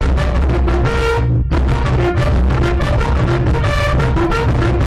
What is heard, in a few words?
bass remix; the noodle and bun